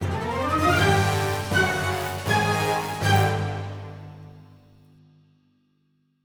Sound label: Music Fight Epic Theme Bpm160 End; asterix obelix slap them all 2